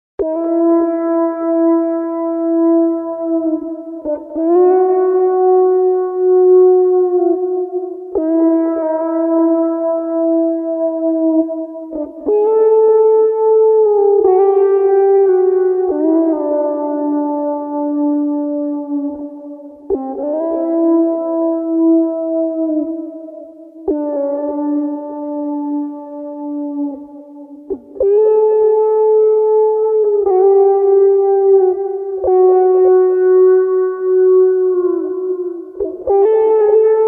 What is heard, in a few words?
melody